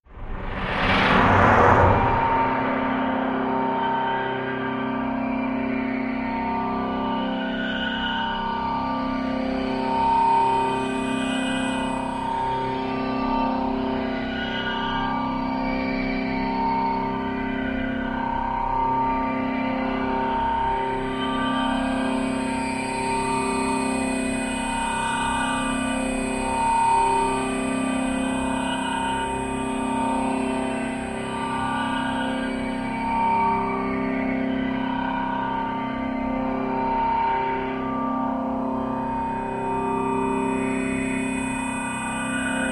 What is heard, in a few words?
Flashback intense whooshing intro, steady mechanical pulsing, high pitched digital whines